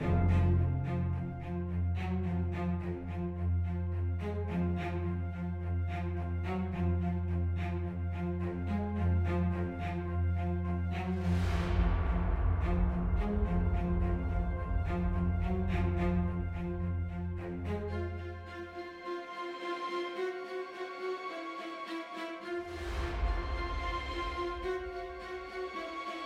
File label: Enemy Fight Stem; Icy Plains Enemy Fight 1 Stem 1